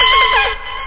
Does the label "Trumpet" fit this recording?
Yes